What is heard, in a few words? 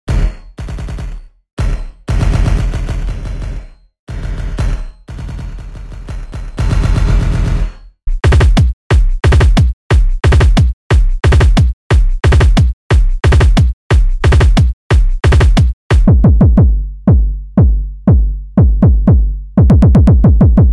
twisted kicks